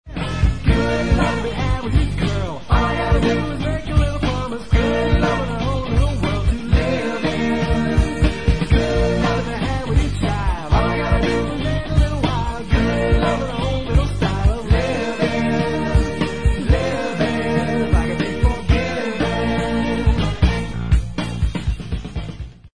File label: Bkg Voc